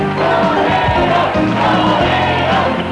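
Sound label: torero, Audience chant, The audience sometimes gets into the game and does soccer chants when the game gets exciting. This chant of "torero" is used when a contestant pulls off a difficult challenge or does something "heroic".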